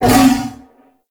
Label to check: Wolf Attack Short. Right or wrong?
Right